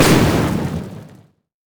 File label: boom; valkyrie; LoL; corki